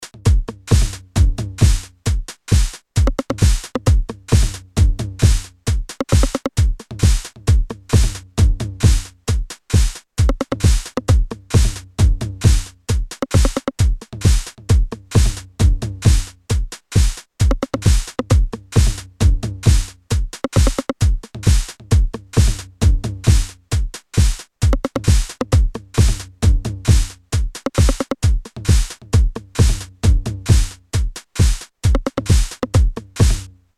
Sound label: AKAI MPC60